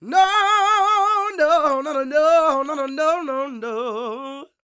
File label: SOUL, Vocal Planet, Blues & Soul; CW SOUL